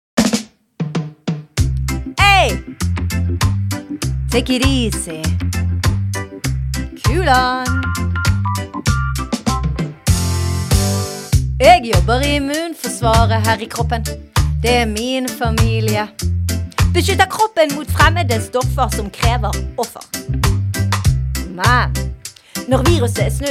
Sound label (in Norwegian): sang kor instrumental